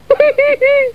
hehehe; moto

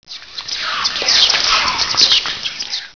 spooky

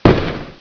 fireworks explode

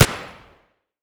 nail shoot, grenades

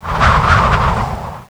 steam burst

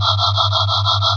fridge; ambience; rebar

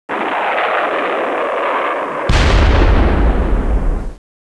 missile

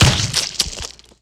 gibs; player

